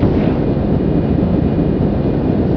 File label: Hover, thrusters; 16bit